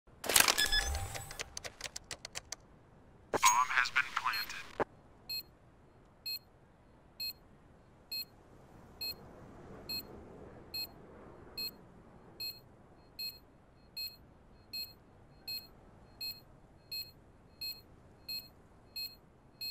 C4 / Bomb Planted & Explosion - Sound Effect; CSGO Game SFX

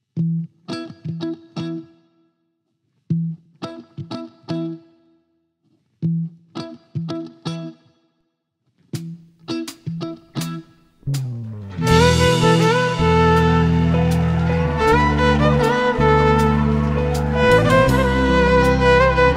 violin cover

